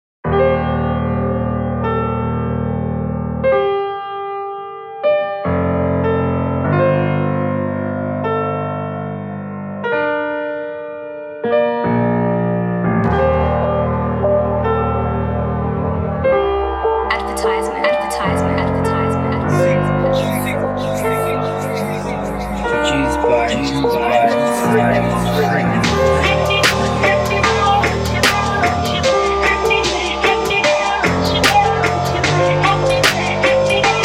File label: SOUNDTRACK INSTRUMENTAL